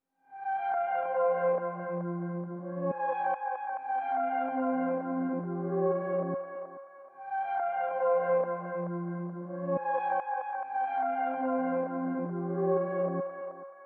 140 BPM F Min Reverse, melody loop stem; Trap; Loop Stems; Trap Pt 2; Plug Talk

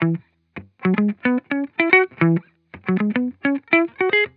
Vibrations Pop Guitar Loop 110 BPM E Maj; Cymatics; Vibrations Guitar Sample Pack

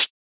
Closed Hihat; Ghosthack - Free Techno Drum Shots 2024

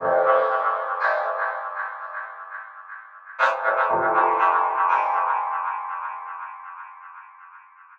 Dub Chord Loop Fmaj7 120, Dub Techno; Ghosthack; Dub Techno & Deep House Chords

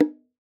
Bongos; Cymatics; Emperor World Percussion (Teaser)

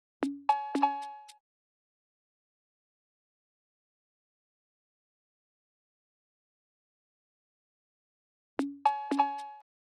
Drum Loop 115 BPM Cowbell; Loop Stems; Cymatics; DOPE DRUMS VOL. 1; Coffee Drum Loop

